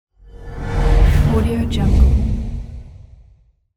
magical spell sound

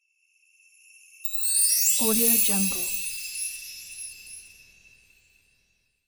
magic bells

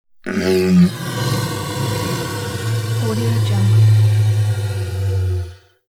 creature monster inhale low

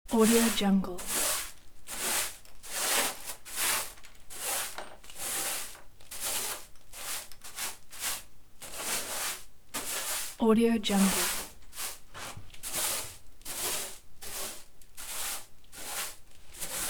sweeping floor

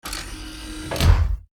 servo switch off; sound ideas

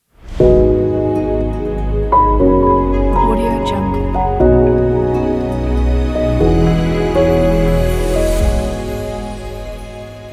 nature piano logo

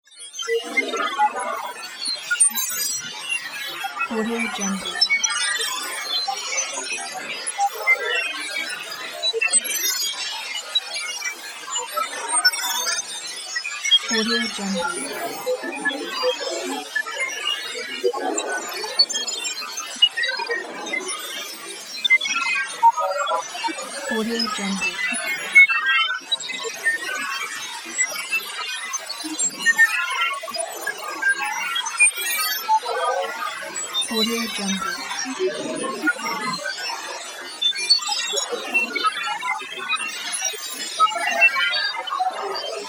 cyber chaos; mountainaudio

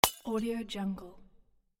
bottle crash

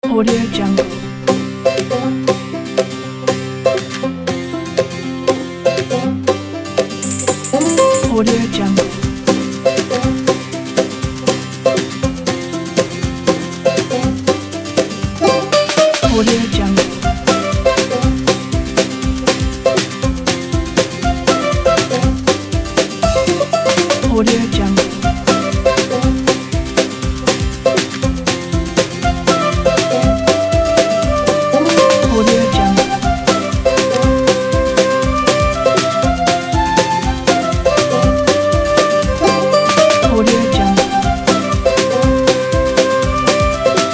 spring walk

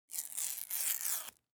christmas present wrapping curling ribbon

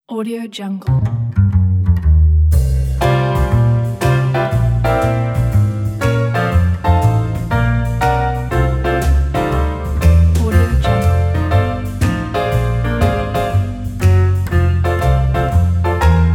jazz blues

